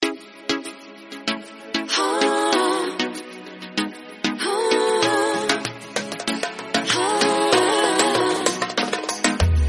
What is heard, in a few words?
soft music mobile tone ringtone